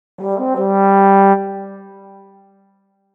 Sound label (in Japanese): 法螺貝